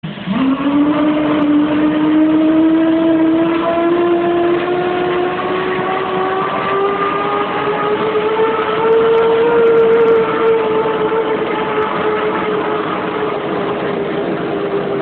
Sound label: F-15 Startup; JFS